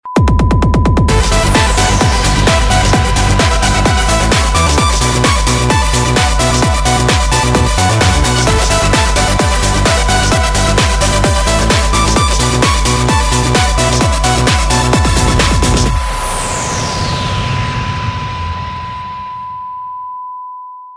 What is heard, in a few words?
air groove